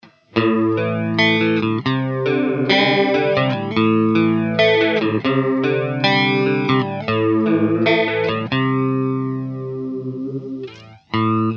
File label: Analog flanger, Гитарный процессор Line 6 M13; у этого есть регулятор скорости, глубины, фидбэк и выбор частоты флэнжеровки и микс